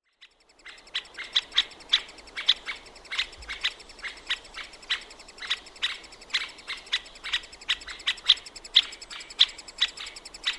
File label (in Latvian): pictri balss kik uztraukums; rudbarzi; i.folkmanis